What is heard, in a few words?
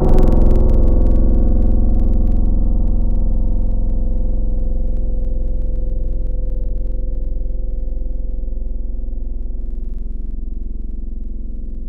Piano D; Synthetic's Serum Collection; Surge Sounds - The Sky - Serum Wavetables